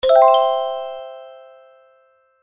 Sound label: coin